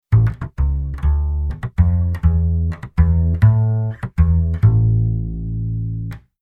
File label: bass latin bass